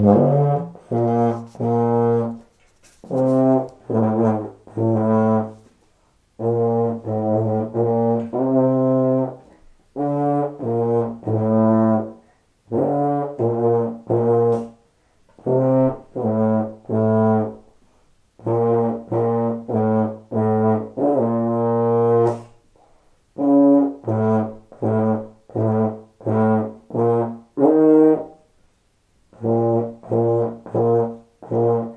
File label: german tune